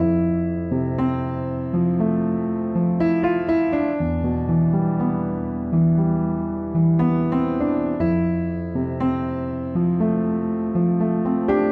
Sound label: Progression F Major - E Minor 60 bpm, Upright Piano; MIDI Clips; Summer Breeze; Ableton; Factory Packs; Spitfire Audio